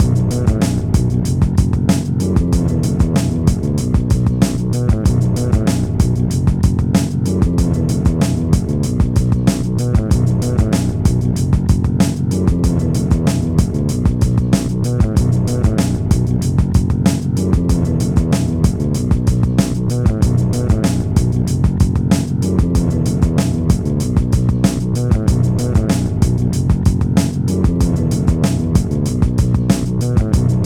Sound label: Load Loop; NBA Street; GC; PS2